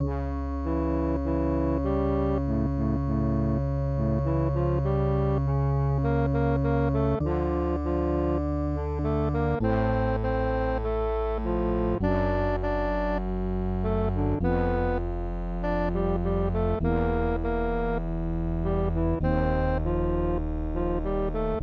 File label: MIDI